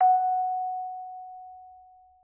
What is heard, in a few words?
orgel